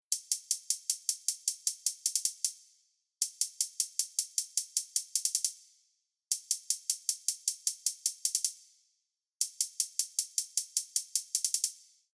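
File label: Future Bass Rhythm Bass hi-hat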